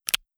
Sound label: out Of Ammo, Weapons; Game Kit Controller